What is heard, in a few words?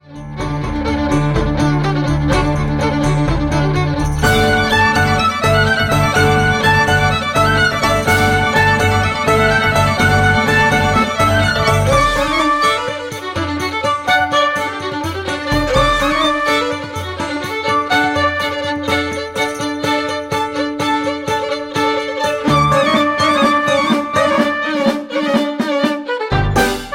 Hoedown